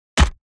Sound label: BOW hits